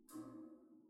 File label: catwalk footsteps